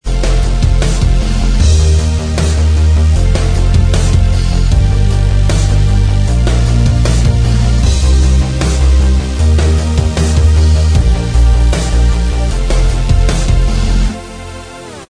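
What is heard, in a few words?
Inst Track, instrumental track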